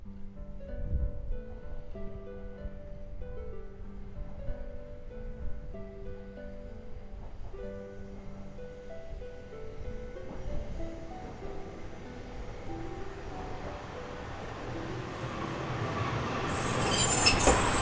路面電車 停車〜発車; 停車〜発車まで; SE

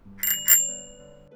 自転車ベル

